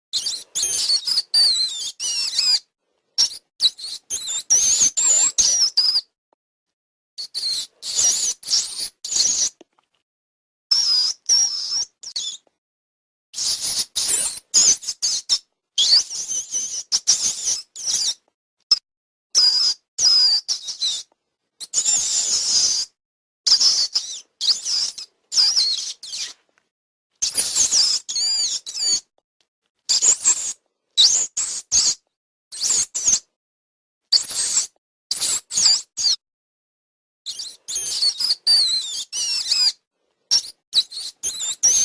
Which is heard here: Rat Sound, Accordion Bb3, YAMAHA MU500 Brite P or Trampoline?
Rat Sound